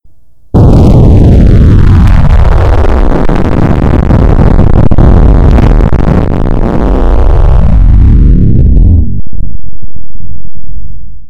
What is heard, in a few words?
abomb big, explosions nuclear